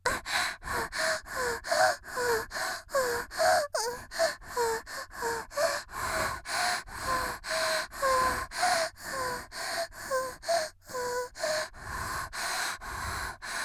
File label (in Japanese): 「息」絶頂後の呼吸20秒; 綾波系少女